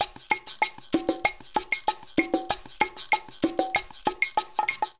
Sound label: Son 192bpm, Latin Percussion; Ableton; Factory Packs